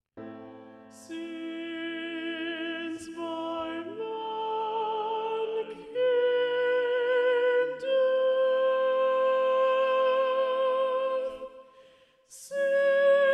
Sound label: Soprano Predominant; Since By Man Came Death; Messiah; Handel